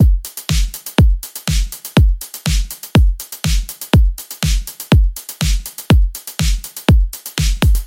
Battery Full Drum, 122 BPM; full drum kit; Forge

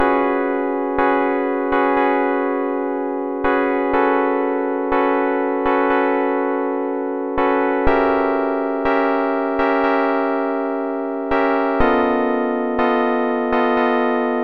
122 BPM Tonic C Emaj Cmin Chord Progress; mmb chord progressions essentials

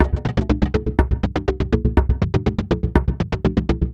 122 BPM Sequence Cmaj Amin Tonic C; innovative sequences 1

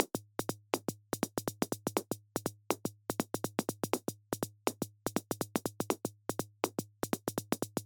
122 BPM Micro Drums; percussion essentials 1

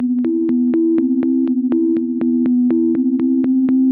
122 BPM Melodies Cmaj Amin; Curated Blocks; Tonic B; mmb studio soundtrack essentials

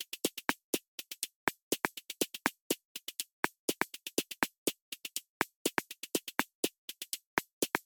122 BPM Micro Drums; mmb drum arsenal vol 1

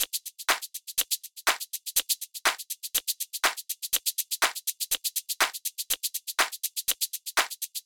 122 BPM Tops, percussion; Percussion Essentials 1; MMB

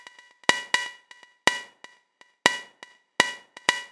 122 BPM Synth Perc; mmb drum arsenal vol 1

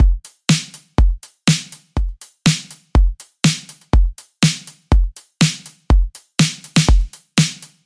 122 BPM Full Drum, full drum kit; Battery; Essentials Pro

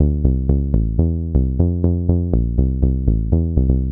122 BPM Bass Synth F maj D min Tonic C; Pro Bass Synth Studio Kit; MMB

